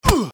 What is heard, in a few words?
hurt; Keep Out! A Dungeon Adventure for the Brave